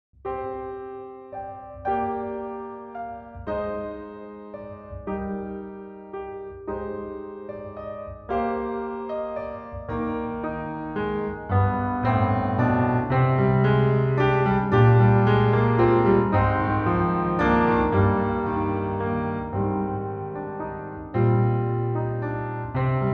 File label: Main Theme (Piano); Binary Haze Interactive; Ender Lilies Quietus of the Knights